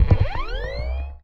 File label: turn on